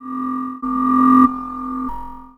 BASE, ELECTRONC

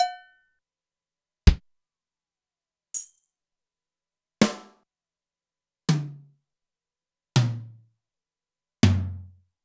Zoom MRT-3B Kit

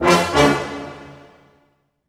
brass accent; Orchestral Loops

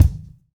BD; Best Service; Dance Mega Drums

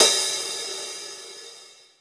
open, Drums Percussion; Zero-G; Total Drum Bass

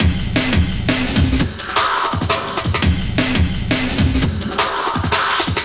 Hammer Break, Drum & Bass, Beats & Breaks

